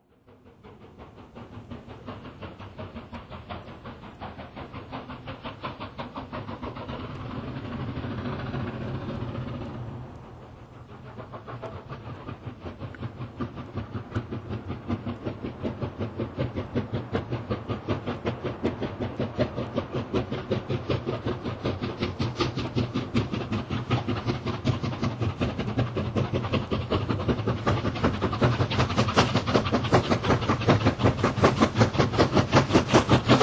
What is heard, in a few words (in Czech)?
lokomotiva